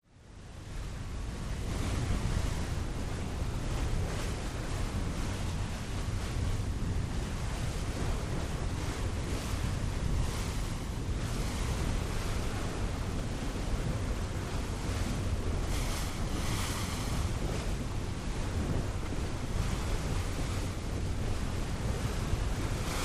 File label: Ferry Boat Steady